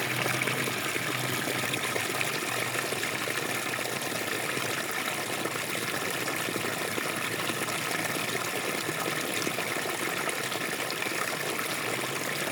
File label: Water sound effect